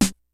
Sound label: snares; Kid Nepro; Elite; KICKS SNARES